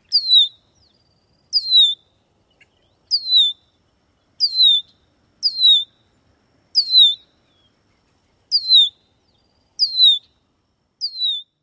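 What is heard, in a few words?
male two-parted alarm whistles, Red-winged Blackbird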